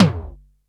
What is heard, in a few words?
MID TOMTOM, D110 DRUMS; Crypton - DrumBase5000